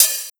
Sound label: HiHat Glitch Open